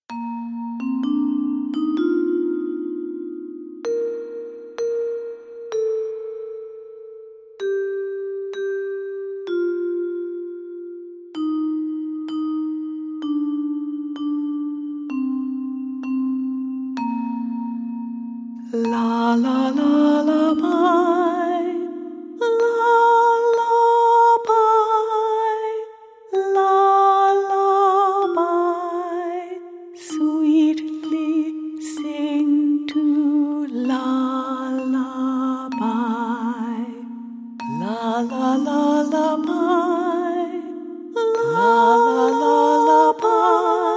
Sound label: Russian Lullaby